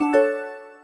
key menu move, keytone; Samsung SGH-J700

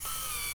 focusing, ui; GFive G9

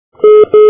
call wait; Samsung; SGH-i637 Jack; atnt

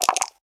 Throwing Dice; Realme 8

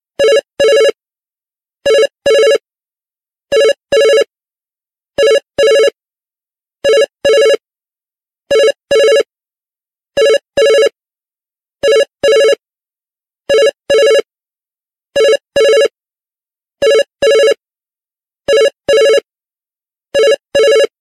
Simple Beep, ringtones; LG Revolution VS910

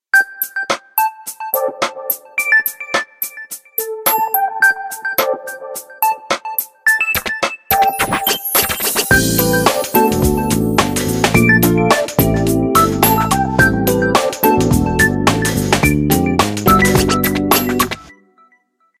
E-Pulse, ringtones; Alcatel OT-991D

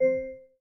Class Harp, notifications

